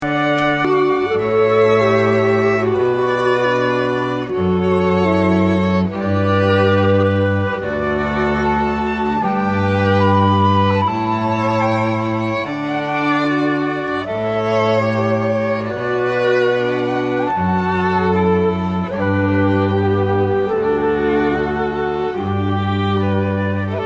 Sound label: flute & strings; Pachelbel